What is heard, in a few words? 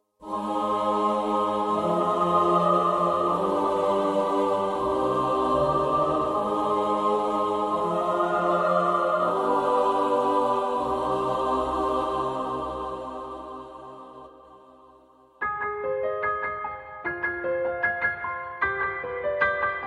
Piano Cover